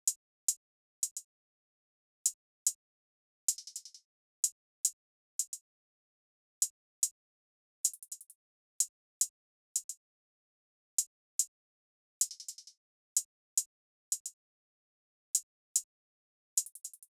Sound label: Drum Loop 110 BPM Hihat; Cymatics; Vibes II Drum Loop Collection; Avenue